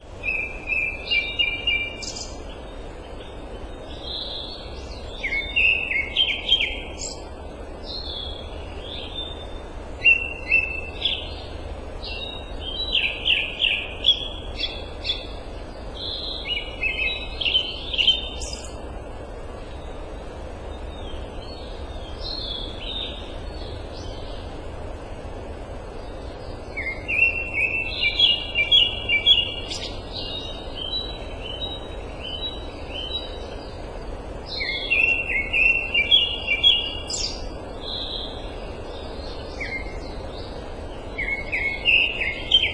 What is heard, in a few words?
grey thrush